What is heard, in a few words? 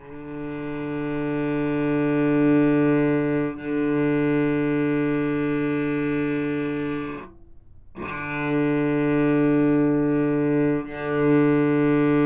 D3 Sustain, Novice Cello